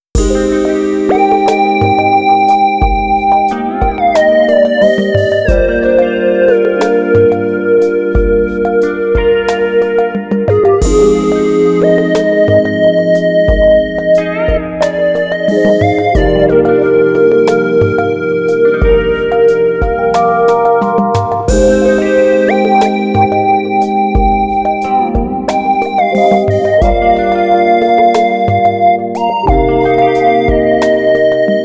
sad song; Study Steady 2

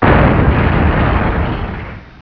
Explode

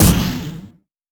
Rocket Shoot

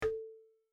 UI Button; my cute commissar 2020 gamerip

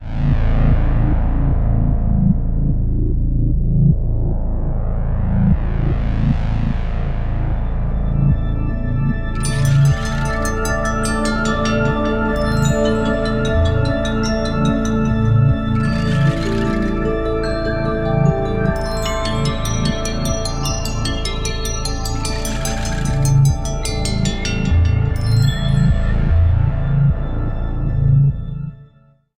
rollercoaster Car; remastered; gamerip; Sam & Max Save the World; moonretreat

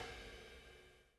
Loop Tail; Good Job

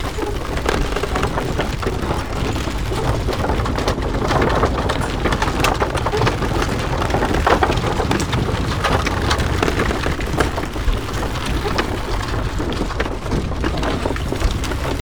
elevator Loop

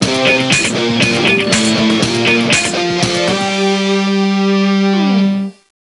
Stage End; teenage mutant ninja turtles arcade attack; nds

